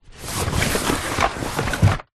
household under bed storage box fabric slide carpet